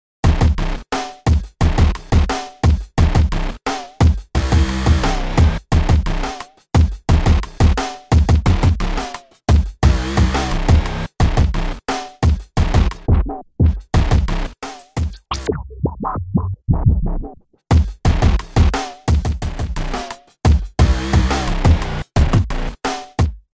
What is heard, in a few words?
drum; Emu XL1 Xtreme Lead; kit 2 modulated